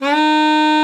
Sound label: D#4, alto sax; Xsample VOL-2